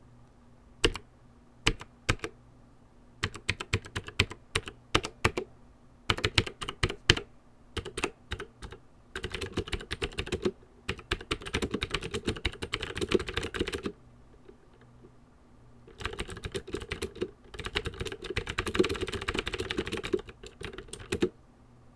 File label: Cherry Blue Switch Sound